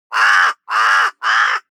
animal bird bird raven; smartsoundfx

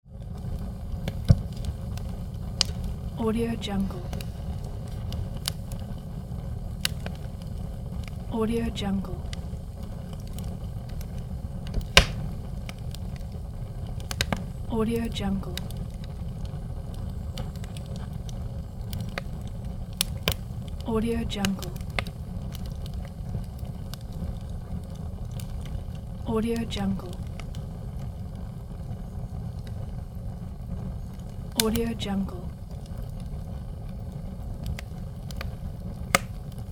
fire in furnace

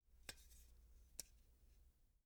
ammo magazine

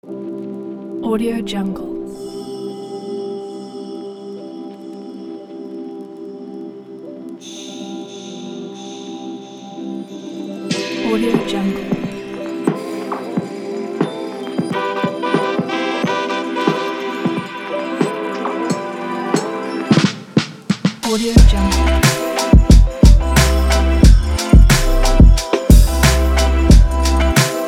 summer chill

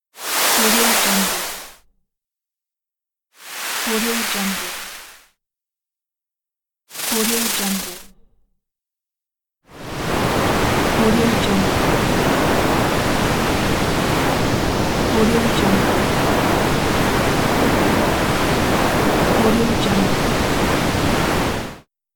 glide on snowy surface; productionelements

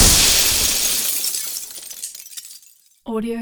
matter mayhem explo big window; soundmorph

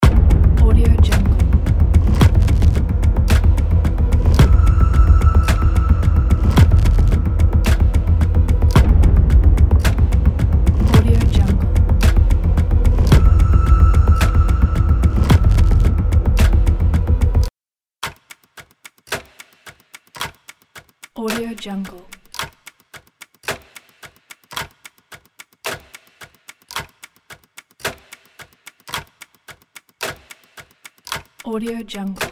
dark ambient background loop

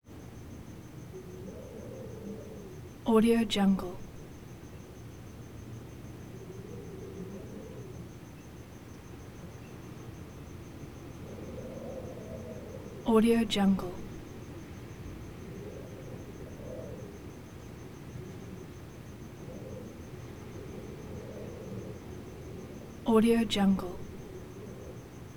wind family room interior; tujunga

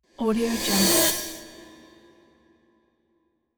scary high metal reverse

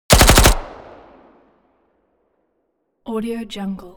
weapons smg uzi 45 heavy burst

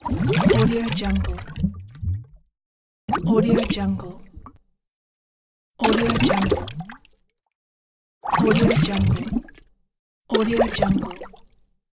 large bubbles

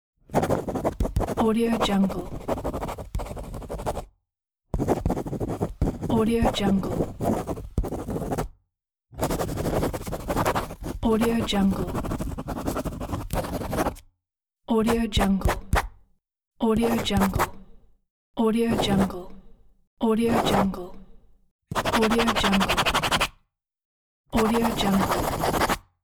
pen writing; sounddivision

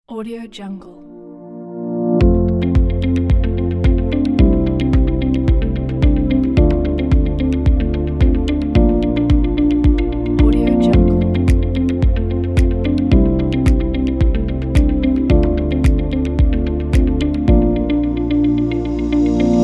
soft ambient commercial warm inspiring

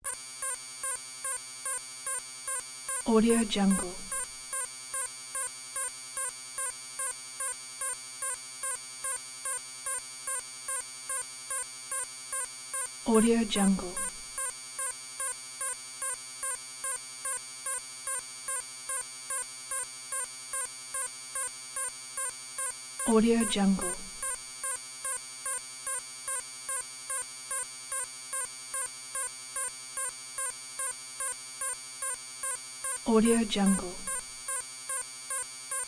graphics beeps

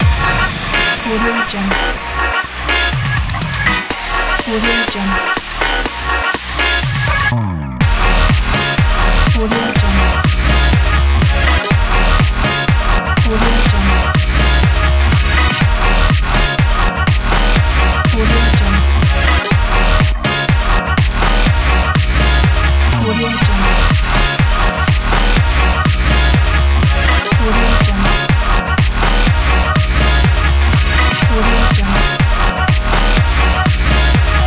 french house

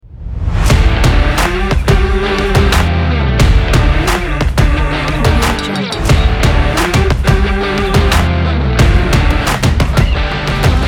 sport promo

